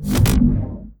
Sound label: ui result